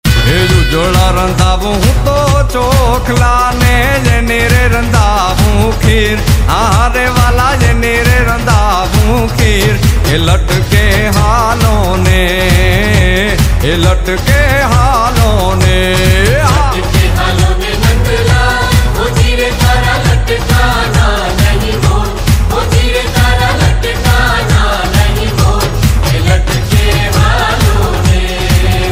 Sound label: gujarati ringtone